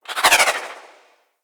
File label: Arch Wing Dodge Roll Left; Vehicles; Movement